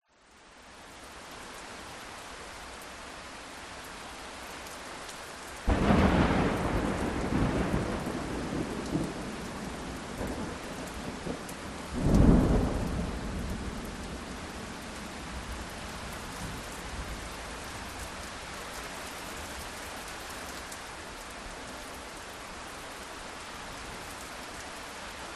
nature thunderstorm med rain various lightning strikes direct oh; 2min; zapsplat